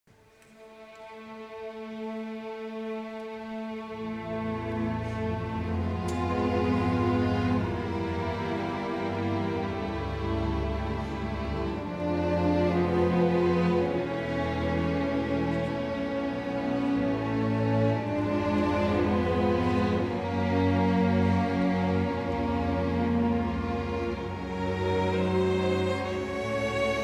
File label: Jazz Passacaglia